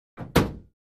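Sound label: Gym Weight Equipment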